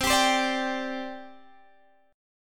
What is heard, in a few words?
C Suspended 2nd, C, down, Guitar, Standard; Csus2 strummed